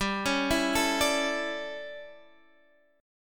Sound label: D Major 7th Suspended 2nd Suspended 4th, G